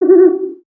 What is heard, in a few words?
Owl Call; Valiant Summoner